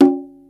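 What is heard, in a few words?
quinto open, Yamaha Motif ES drum machine; 300 Drum Machines; Yamaha Motif ES-Series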